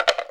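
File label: Deep Shaker, dry perc; Quasimidi Rave-o-lution 309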